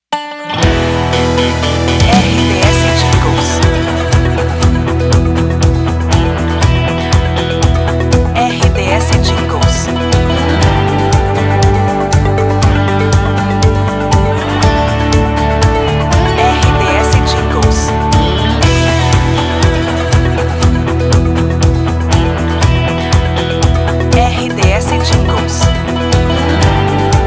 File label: theme news trilha; 92 FM 2023; Pacote 92 FM, Timbó/SC. Pacote com característica pop rock